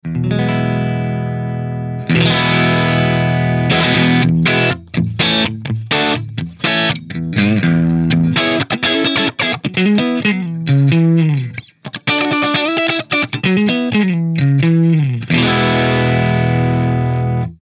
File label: Engl crunch warm